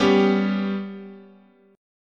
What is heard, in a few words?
G Major Flat 5th, guitar chord